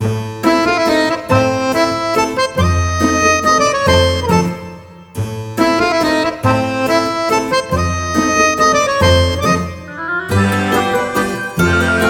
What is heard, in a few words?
Silent Witness loop, BGM